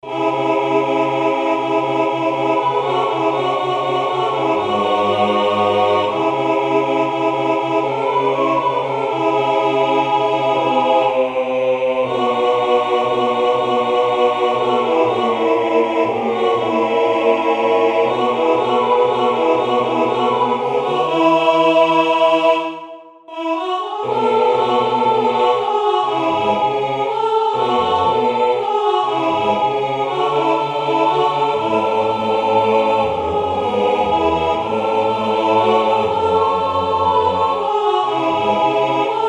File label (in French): tutti